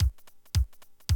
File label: Classic DrumLoops, 110